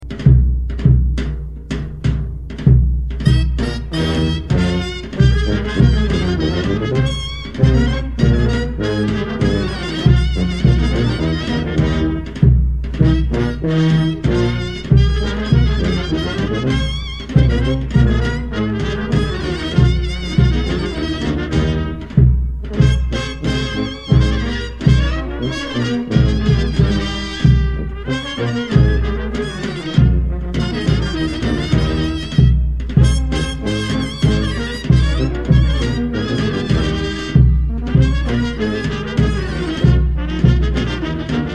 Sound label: brass band